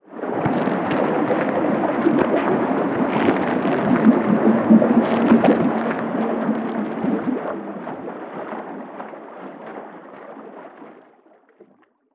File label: Underwater Water Shark Attack Splash Thrashing